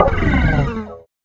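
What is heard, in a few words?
GUI Rutsch rauf, acoustic guitar; Best Service Gigapack 2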